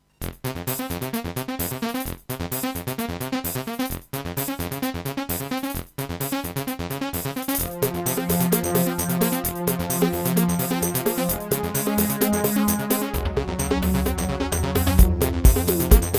Terratec MiniWaveSystem SOWT-11; Descent - Level 1

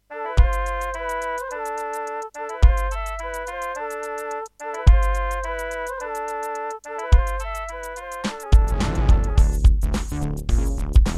Serdaco McQub; Lost Vikings

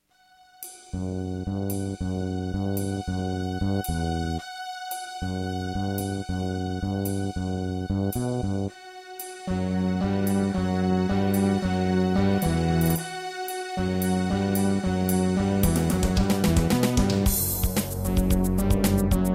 Terratec MiniWaveSystem SOWT-11; Duke Nukem 3D

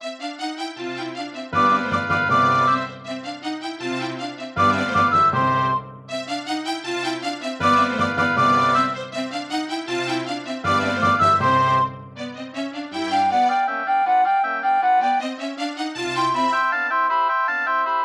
Mozart - Concerto K467 partial, Yamaha DD-55; classical

